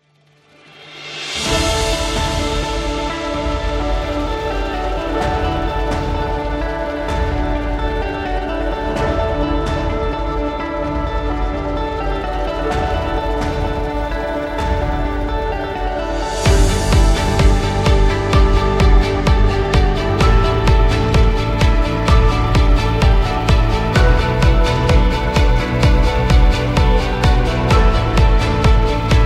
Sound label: Inspirational Motivational